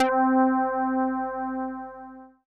Dance Floor Bass, Multisample Basses

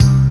DM BAS, Dance Mega Synth; Best Service

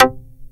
TURBO ACID

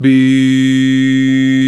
VOX, Male Scats

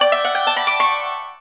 YANG CHING, Heart of Asia; Spectrasonics

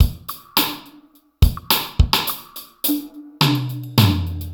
Drums

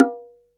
bongo, drum machines

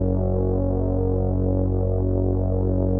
505, String Machines

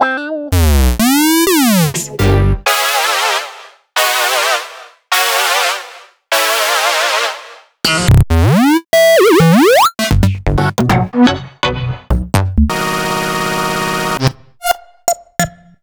Synth Shots

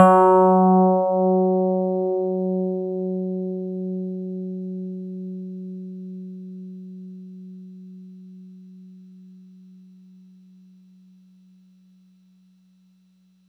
FM Tine f#3, Yamaha YX81Z

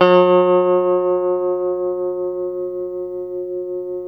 TELE TREM G, Guitars, TREMO; Spectrasonics; Hans Zimmer Guitars VOL-1; TREMO-LUXURY

